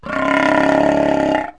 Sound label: SNORT; Wired for Sound Pro CD; Aristosoft, Inc.; animals